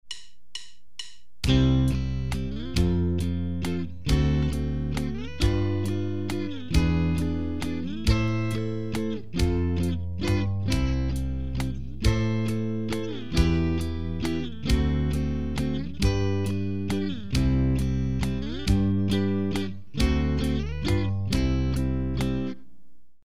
Christmas song progression using A-based barre ch, guitar